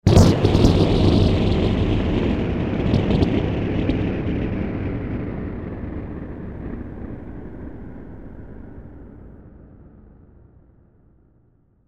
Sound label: thunder